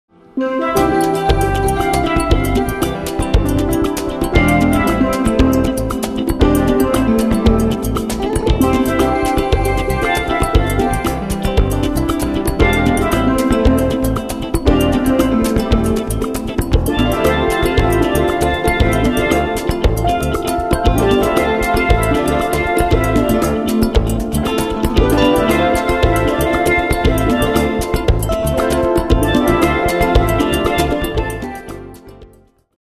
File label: Samba